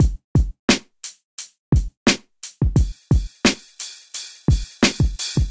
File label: MPE Turn Swing Kit, Golden Era Hip-Hop Drums; MPE Kits; Ableton; Factory Packs; Golden Era Hip-Hop Drums by Sound Oracle